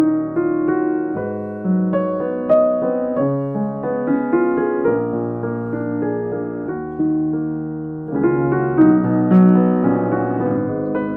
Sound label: Petrof 118, piano